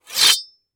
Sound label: Weapon Metal Scrape Sword Scrape; AdobeCC